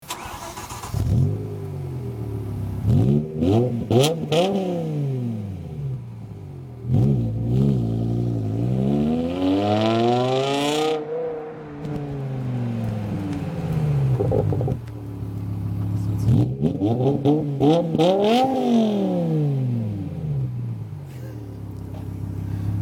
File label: BMW M6